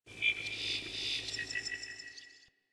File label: Venus Landscape Mid Creatures; Ambience; Warframe; Venus Open World